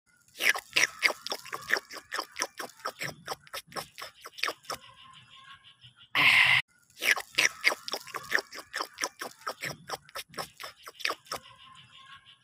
qolop qolop; قلوپ قلوپ

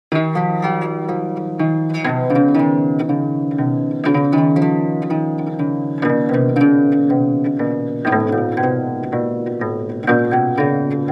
Kithara

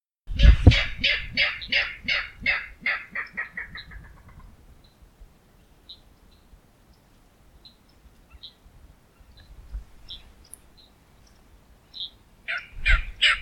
King rail; پرنده یلوه شاهی

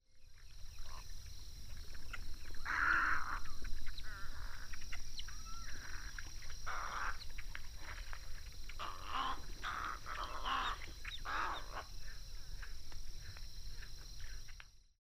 Snowy egret

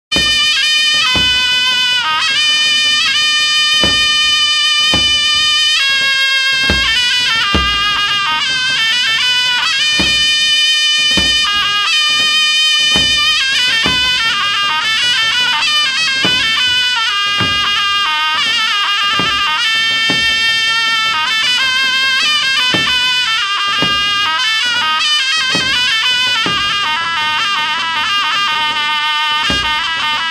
Dohol Bakhtiari; دهل عزاداری چپی بختیاری

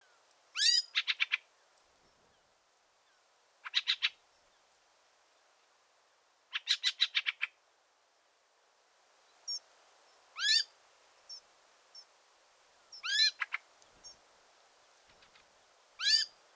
Fieldfare

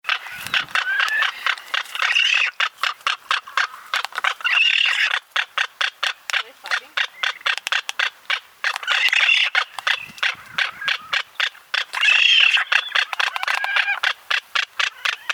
frigatebird

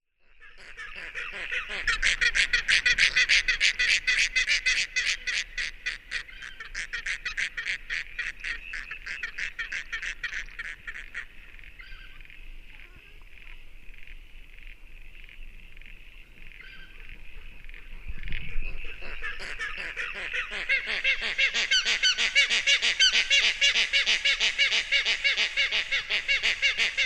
Tasmanian nativehen

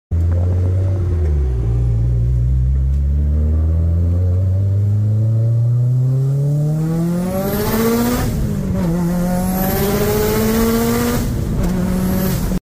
subaru

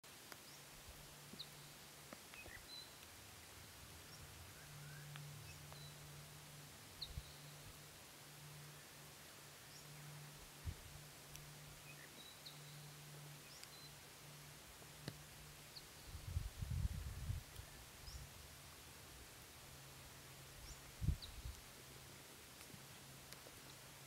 Valley

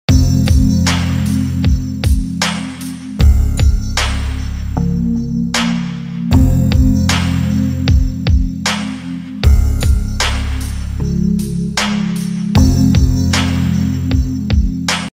iphone 16 ringtone

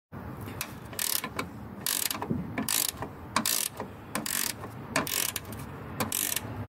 Ratchet wrench; آچار جغجغه

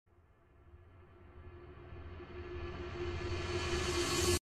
Riser; هیجانی رایزر

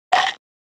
Nibble, گاز زدن

